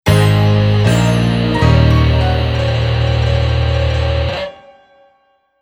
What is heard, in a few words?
End Tag Light Sitar